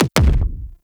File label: Weapon Punch Double